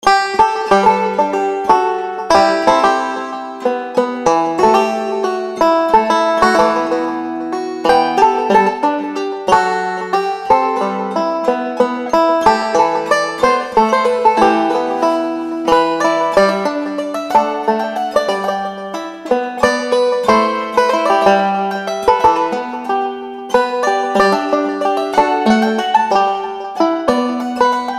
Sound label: sweet love americana banjo loop